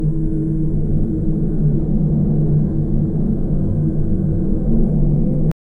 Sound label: cold mirror ambience